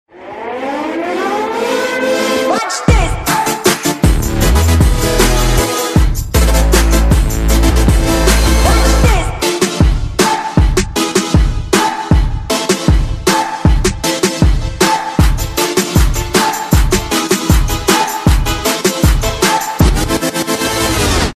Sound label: Ringtone; iPhone 8 Trailer Ringtone; tonuri diverse